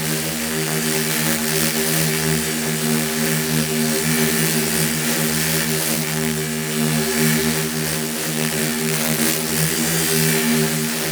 laser loop; Ambience; Gameplay; Warframe; Corpus Gas City; Gameplay Remaster

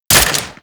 Sniper Rifle Mech; Warframe; Grineer; Gorg

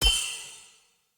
Enemy Weapon Magic Block Layer; Weapon Impacts

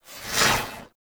Mod Cycle Reverse; Riven Mod

